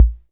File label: pops bd, Korg MiniPops; drum machines